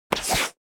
ball bounce back; anemoi

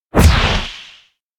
elec punch; anemoi

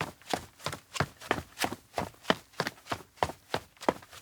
steps outdoor town chase LOOP; anemoi

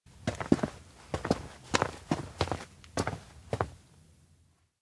steps indoor workshop enter; aino; anemoi パッケージ版 1.1

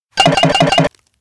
sketchbook trash; anemoi

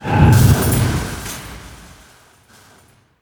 bike crash after; anemoi

